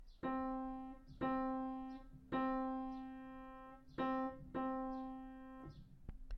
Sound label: cymbals; cymbals crash bang